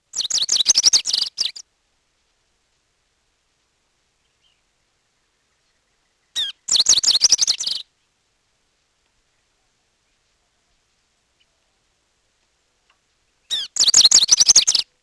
rufous crowned sparrow

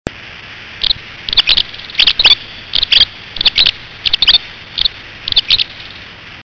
grey hooded sierra finch

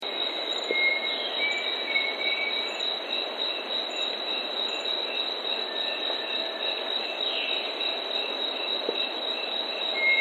pavonine cuckoo

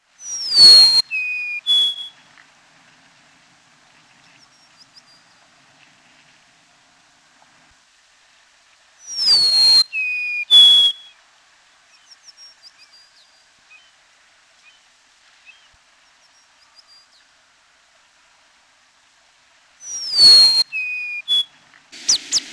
golden crowned sparrow

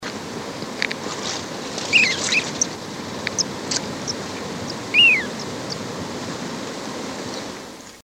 magellanic plover

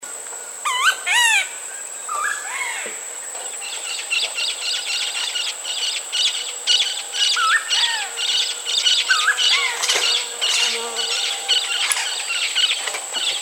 waved woodpecker

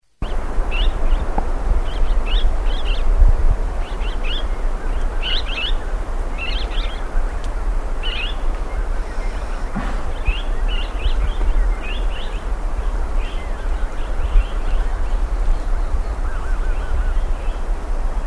barred parakeet

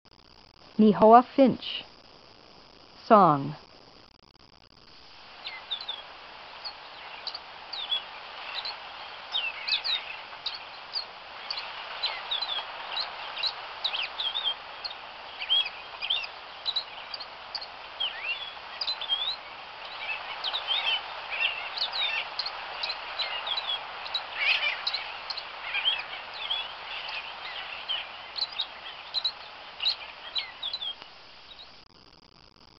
nihoa finch

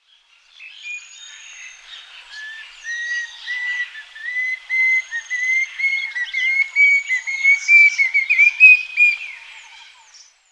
banded bay cuckoo

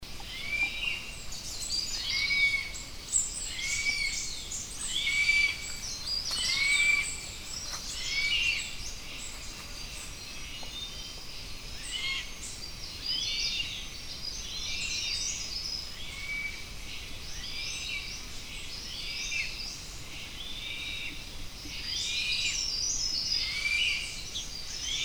black eagle

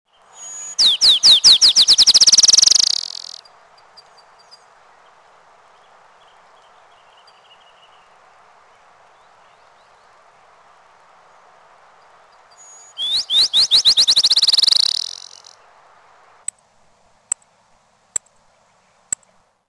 black chinned sparrow

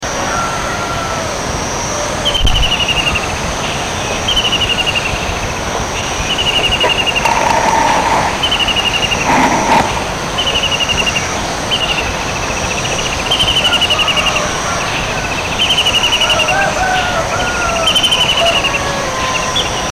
javan kingfisher

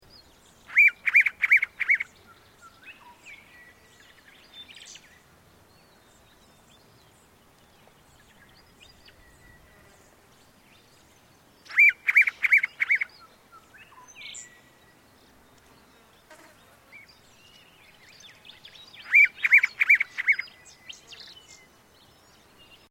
hooded robin

